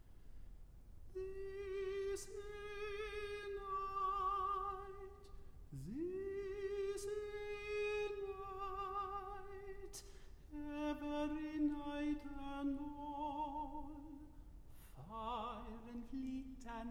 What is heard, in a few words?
Serenade for Horn, Tenor & Strings - Dirge